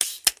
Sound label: Masking Tape; Office Supplies; 1001 Sound Effects